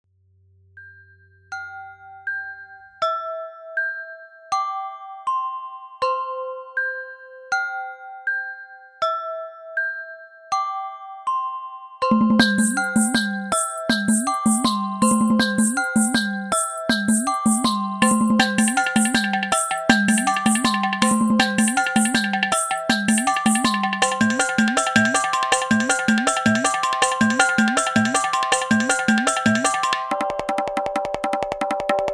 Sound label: bells n drums